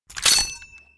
pin pull; gusanos promode

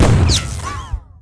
frag; gusanos promode

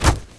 knife flesh; gusanos promode

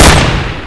flaregun; gusanos promode

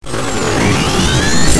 minigun up; gusanos promode

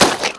gib; gusanos promode

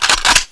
gun reload; gusanos promode

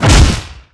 bio fire; gusanos promode

